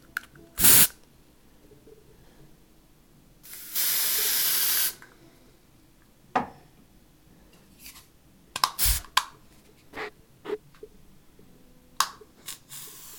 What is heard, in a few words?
Spray